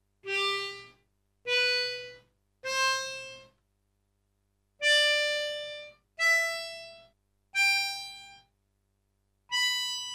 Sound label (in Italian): ARMONICA FRASE STACCATE